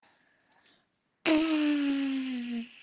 ahh

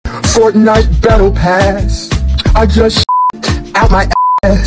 censor; fortnite battle pass

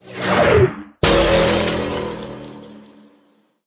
planet explosion sound; Angry Birds Space

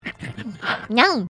biting yum

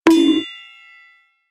bonk sound

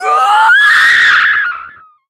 death scream; cassiopeia

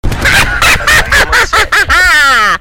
evil laugh

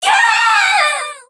serious scream; soul calibur v

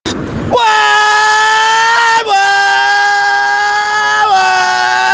vrummm

